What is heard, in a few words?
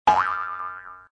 Wink